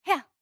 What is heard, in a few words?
Attack Spear; Jackie